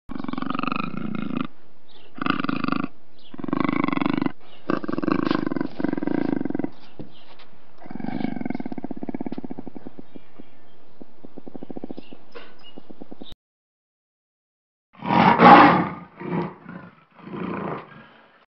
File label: black panther, پلنگ سیاه